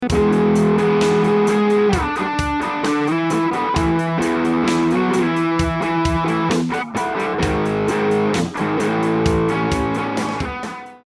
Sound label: Packrat GRF; JHS Pedals